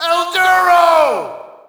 Shout, audience; UFC Tapout 2

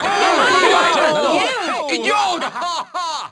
miss, Crowd German

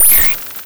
scn slide

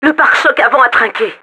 BUMP; Rally Fusion Race Of Champions; XBOX; FRENCH; DAMAGED

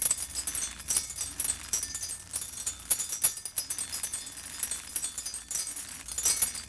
chandelier; impacts explos

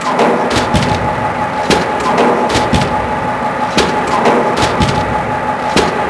ambience steelmill; cold steel

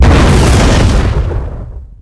Small Exp, Explosions

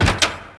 Door Wood Medium Open; Max Payne

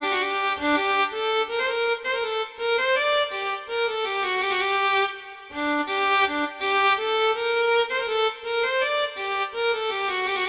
town merchant; Sid Meiers Pirates; XBOX

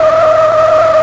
e brake, car; Cars; XBOX

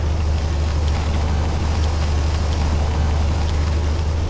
car driv; Matt Hoffman's Pro BMX 2; XBOX

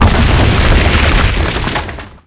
collapse ceiling large

